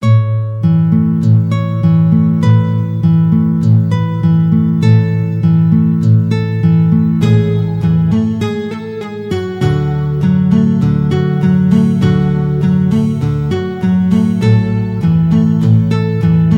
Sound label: Study in G full score with out electric guitar